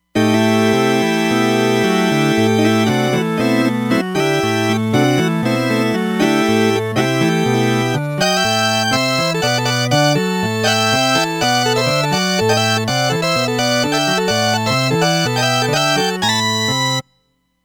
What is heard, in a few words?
Transistor, Roland Fantom XR